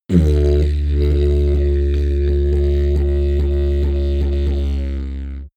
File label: didgeridoo jump medium